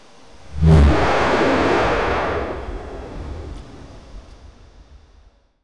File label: falcon attack sound for a